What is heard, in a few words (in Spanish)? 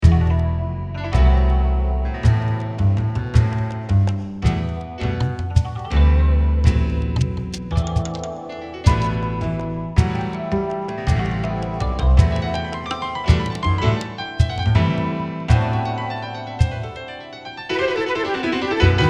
veinte fandangos